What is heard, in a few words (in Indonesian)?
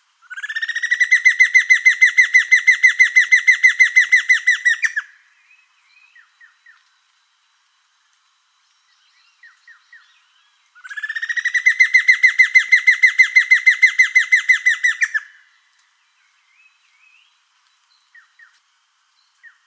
suara giant antshrike